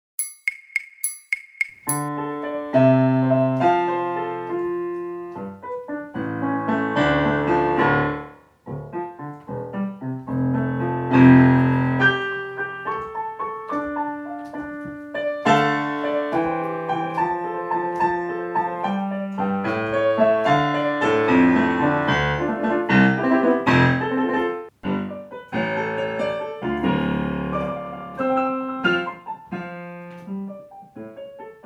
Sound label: piano accomp simple